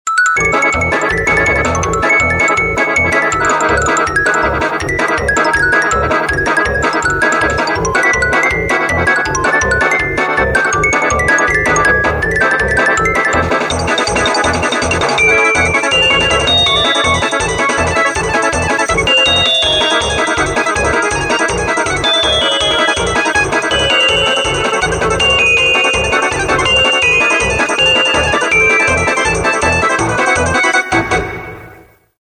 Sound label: gallery music